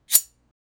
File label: a very loud and rapid, sword